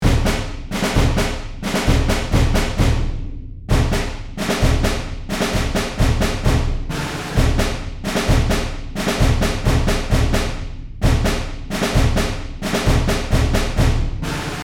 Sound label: Field drum march loop